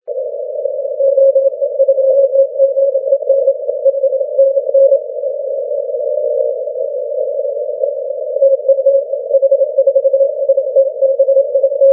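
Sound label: 30m continuous wave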